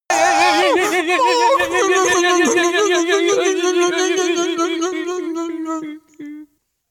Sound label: sad trolls